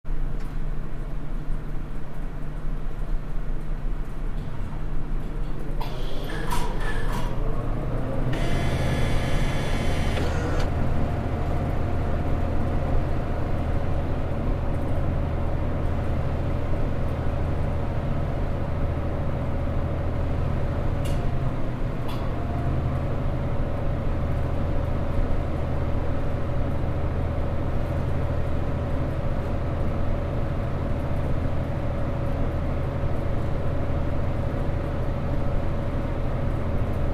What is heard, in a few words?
Coke Machine On Wind, vending